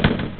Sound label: DISTANT; MOTHER